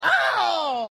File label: male hit